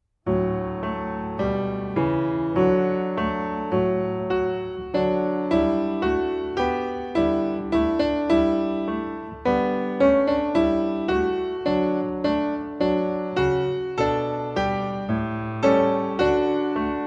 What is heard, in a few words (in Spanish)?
musica solo